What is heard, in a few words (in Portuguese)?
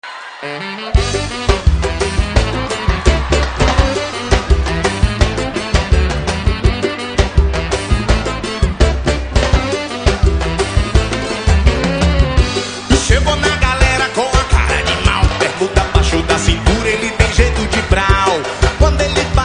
samba